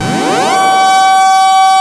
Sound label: HOE DOWN, SYNTH; Keith Emerson Signature Series